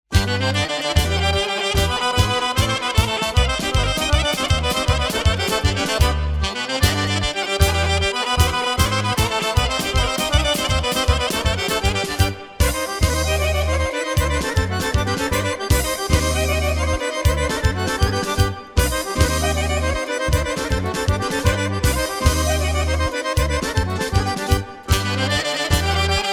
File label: Instrumentalno horo, Народни хора